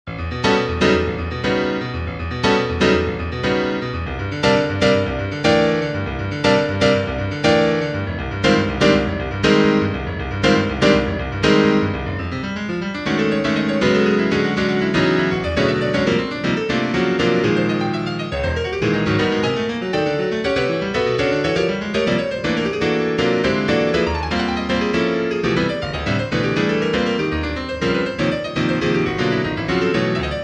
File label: piano